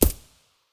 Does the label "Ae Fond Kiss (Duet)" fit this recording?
No